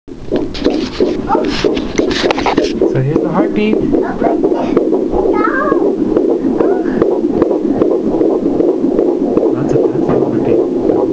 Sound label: heartbeat